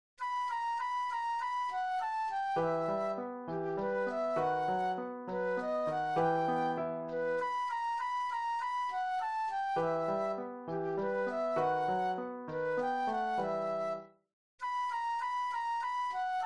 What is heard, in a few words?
Flute Solo; classical